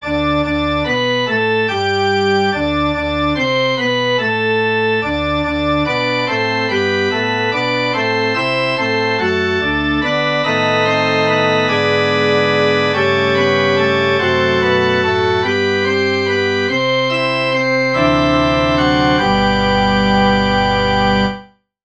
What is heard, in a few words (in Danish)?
forspil; nu falmer skoven